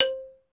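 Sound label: XYLO, xylophone; ORCH PERC; Roland - LA Composer vol. 1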